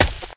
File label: grass, footsteps, infected, walk; player; source engine; left 4 dead 2